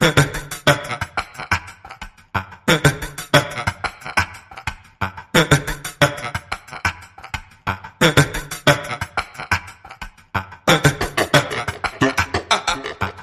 Vocals Abstract Pulse

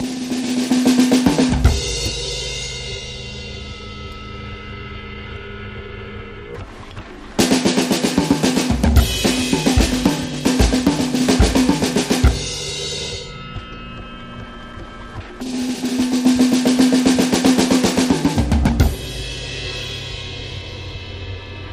Drum Fills

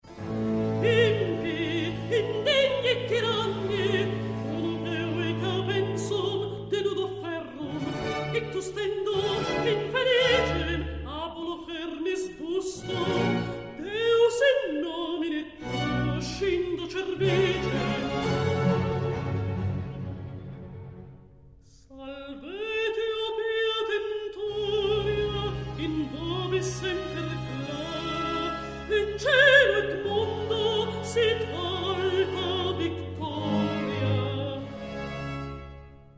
Aria con coro; Vagans chorus- O servi volate; Antonio Vivaldi

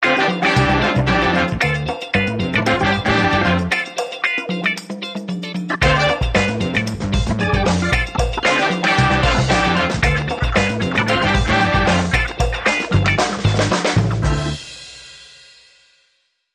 Percussive Muscle

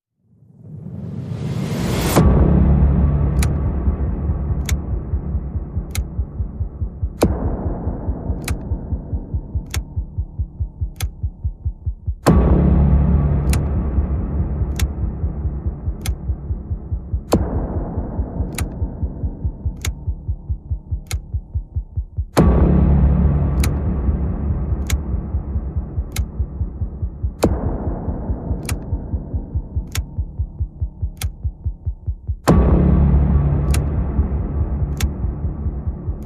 Perc Pulses And FX Only

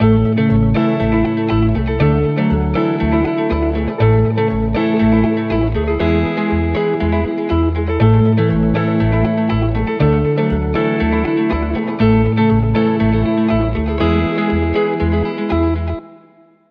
Sunny Forecast, No Drums

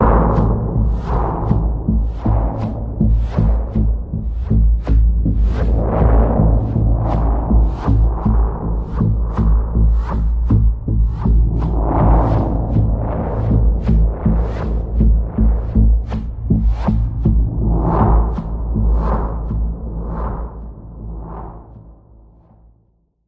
Pulse, Key of A

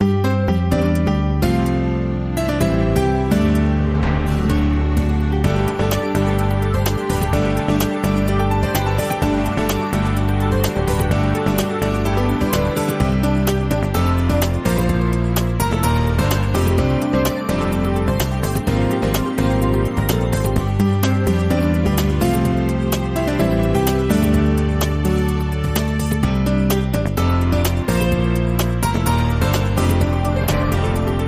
Breaking News Morning

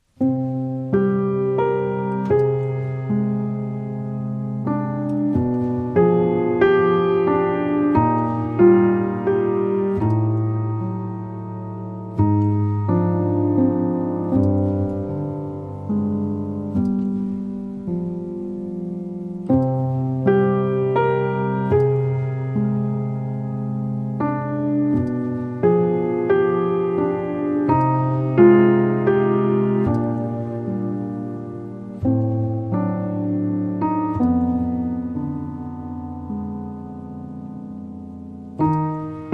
Piano Only

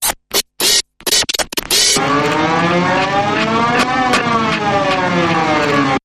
Electronic Bumper Reversed

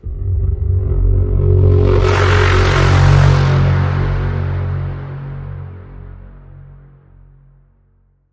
Deep In The Bend, F sharp

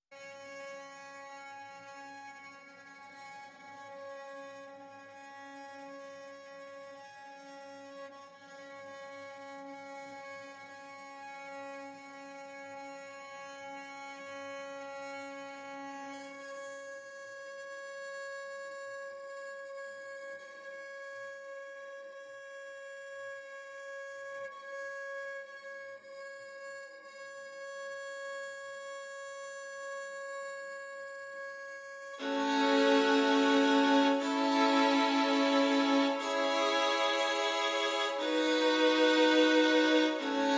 Strings Only Mix

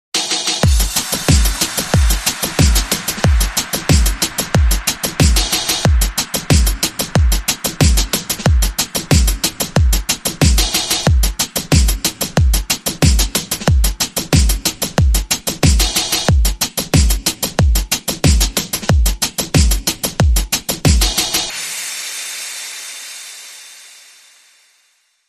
Beat

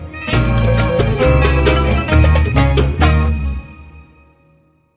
Good Oud, Hit

